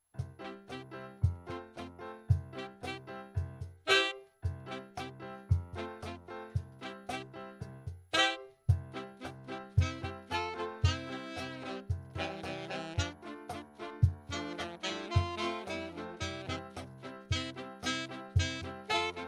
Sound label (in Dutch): instrumentaal